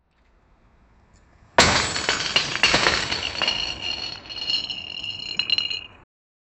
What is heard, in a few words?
crashing sound of a heavy, building